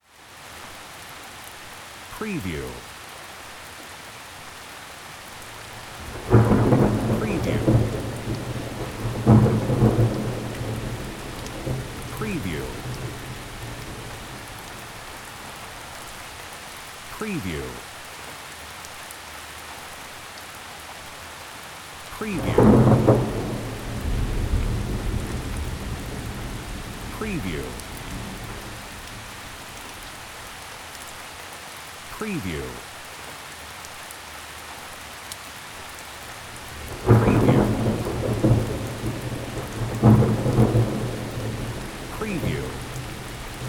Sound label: rain thunder